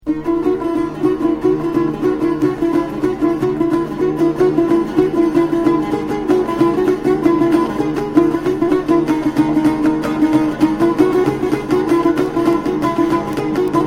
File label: tanbur